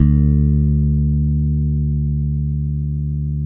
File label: FBASS, Electric Basses; PlatinumPhattCD2; E-MU Producer Series Vol. 8 – Platinum Phatt